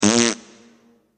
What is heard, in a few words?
fart